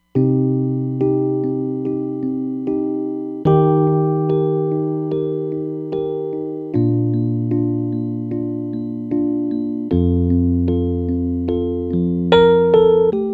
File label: Tine EP, Roland Fantom XR